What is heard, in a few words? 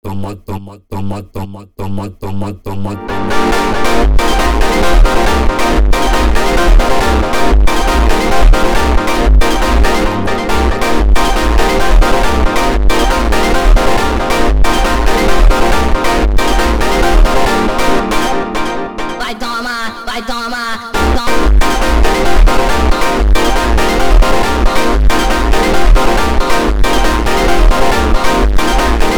melodia especial sped up